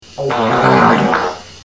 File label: wet fart